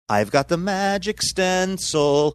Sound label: singing i've got the magic stencil